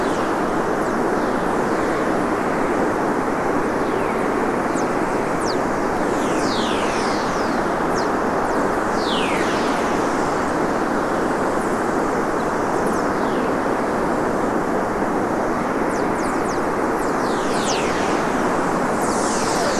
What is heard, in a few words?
WFR audio emfisis